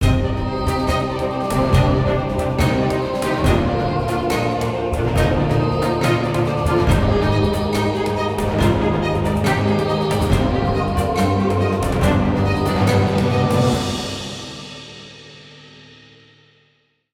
Battle Drums

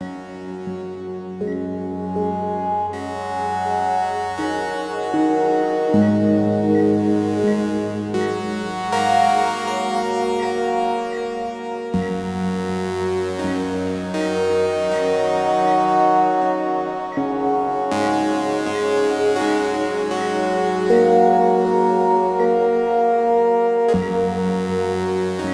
Life Loop Blues

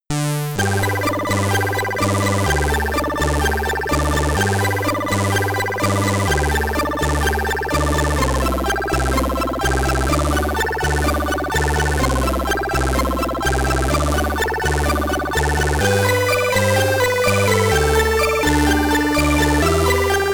Ending SID Stereo; Target Renegade; Commodore 64

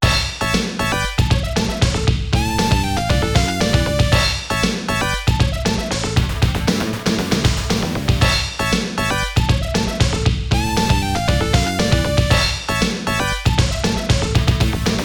TRAFFIC JAM; overtake

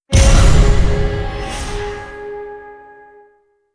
Character Selected; DOS; monster hunter 2

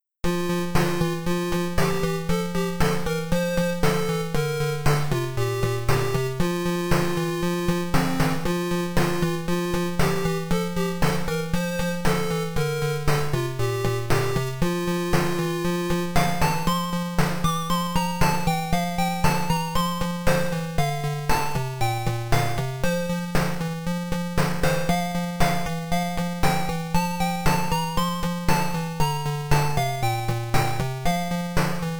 Forest Green (Tandy); Holiday Lemmings

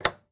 Laundromat Door

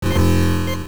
Incorrect; pc engine cd; Quiz Marugoto The World 2 Time Machine ni Onegai

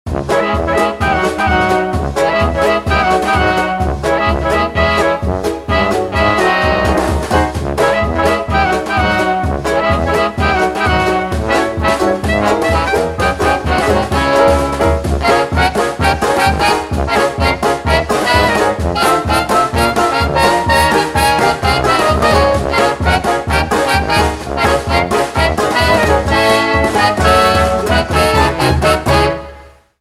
Vintage Jazz; Guns, Gore and Cannoli 2; 2018 pc; Comedy Capers

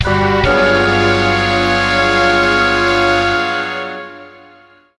Mission Failed!; The Simpsons Hit & Run; PS2; Wolves Stole My Pills!

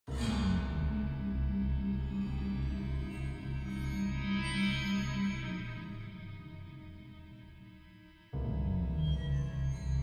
Music Reveal Stinger; Music Cynthia Reveal Stinger

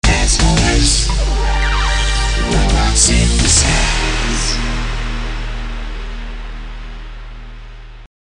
Sting

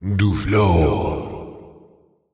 Attack Vo; portal quest; android; Dumbledore

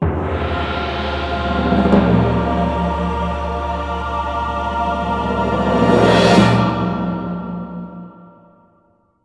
Big Win; Stronghold

